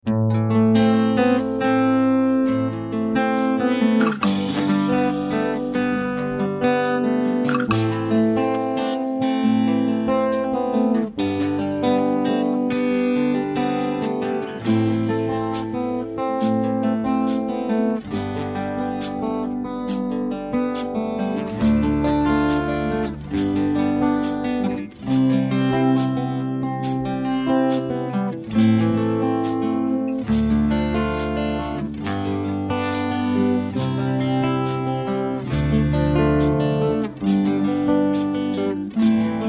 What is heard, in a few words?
Am No Backing Vocals, backing tracks